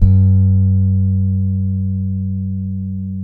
SAMBA, Bass

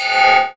SOUND EFFECT, Metal; AKAI S6000 CD-ROM - Volume 1

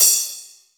crash, break beats

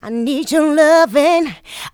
Female Vocals; Houseworx

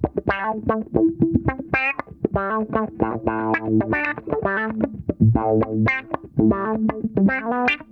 DUCKEY, Funk Guitar; 90 s Sample CDs; Zero G

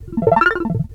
ARP, House arp loops; Best Service ProSamples vol.10 - House; AKAI

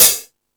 High Hat, Drum Machines

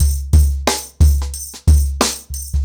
Nuclear 90BPM, ACOUSTIC DRUMS; The Roots Drumloops

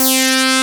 SYN ANA4; Best Service Gigapack 1

